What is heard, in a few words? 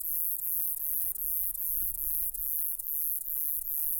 song, katydids; neduba castanea